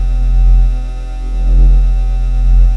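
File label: generator hum; ambience; vendetta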